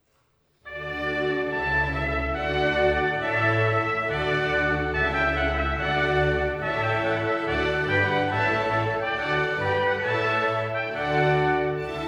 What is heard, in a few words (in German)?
Sonata in D Dur für 2 Trompeten und Streichorchester Grave